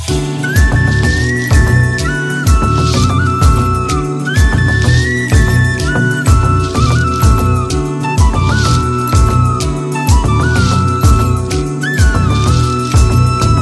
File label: Flute Tone